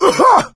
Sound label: cough, player survivor voice; source engine; left 4 dead 2